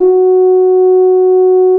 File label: tuba loop note